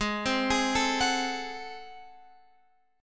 G sharp 7th Flat 9th G sharp down Guitar Open F, chord; G#7b9; arpeggiated